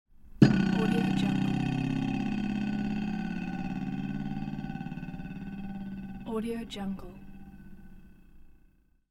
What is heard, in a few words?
hit metal cover